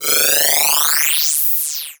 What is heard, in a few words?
Digital; Adobe; Multimedia Internet CD-Rom Flash